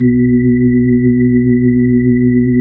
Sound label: B-3 Chorus; Roland LCDP10 Keys of the 60s and 70s 2; Br. Ch. Xfade; Br. Chorus Wh